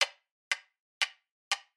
clock room before; nacre

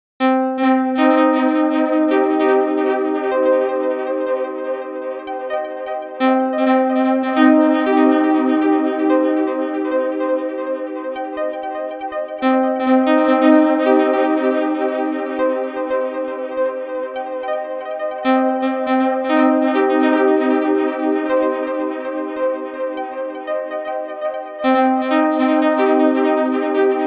voice echo pluckstring; firefly synth 2; sjoerdvankreel

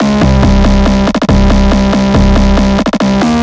shifted phonk cowbell; phonk toolkit; rocket powered sound

